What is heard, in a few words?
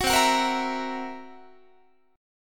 C 7th Flat 5th C down Guitar; C7b5 strummed